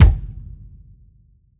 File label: huge bass drum one shot f sharp key